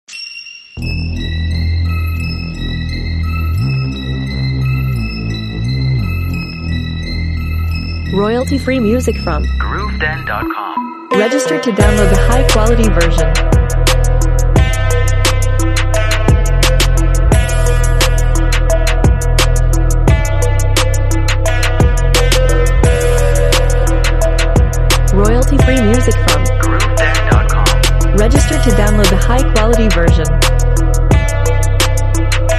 Powerful Trap, Urban trap music feasturing deep bass and trap style rhythm; street, krump